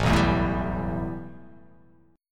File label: A 7th Suspended 2nd